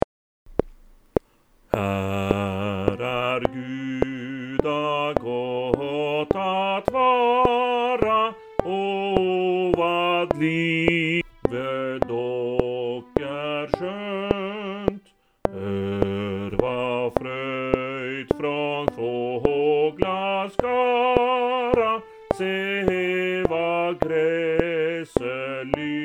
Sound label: Bass CLICK